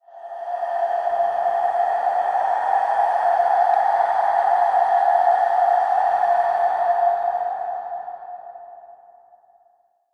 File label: ambient skull breath; ambient air; Half Life Alyx